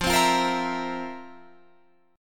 F Major 7th Suspended 4th, Guitar, Drop D

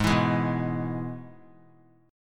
Ab Minor Sharp 5th Ab down; Abm#5 strummed; JGuitar

